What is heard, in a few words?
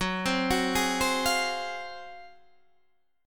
G sharp 13th F sharp